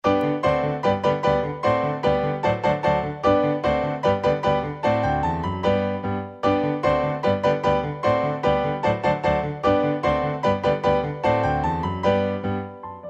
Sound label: Rustic Dance